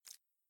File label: slick loop fast